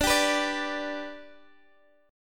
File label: D 6th Suspended 4th D, chord; D6sus4 strummed